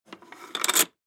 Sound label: Poker Chips Slide, Poker Chips Slide Stack Wood Table; Casino; Casinos; SoundEffects-Blastwave; Sports